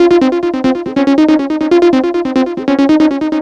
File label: NRG Lead, trance; Leads; Sonic Foundry (Sony Creative Software); Trance NRG VOL-1